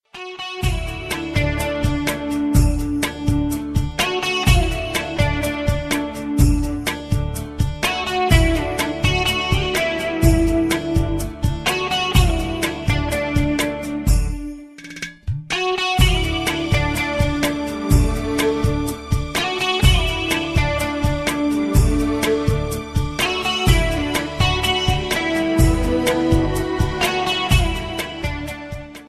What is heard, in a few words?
INSTRUMENTAL RINGTONE